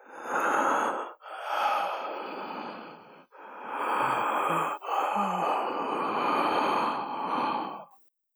Breathe

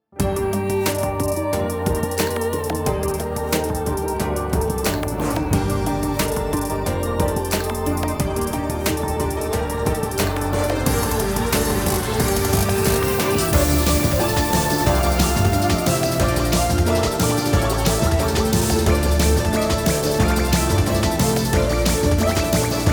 Fugue Remix Version; BWV 578; Destiny Child

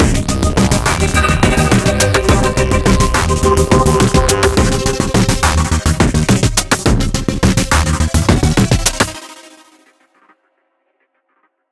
Fight Low Combo; Remember Me

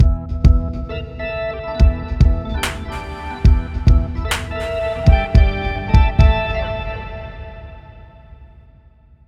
Ditty

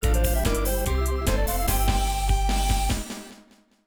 Travel Slot ~ Congratulations!; Hayaoshi Quiz Nettou Namahousou; jaleco mega system 32; arcade

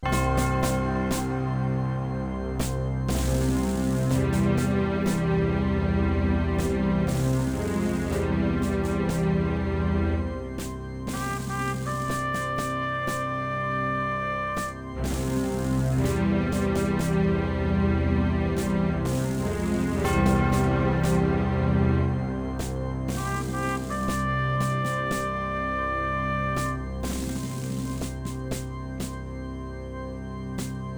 Game Over; starship troopers terran ascendancy; windows

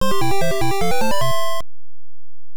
Miss; Lode Runner; NES

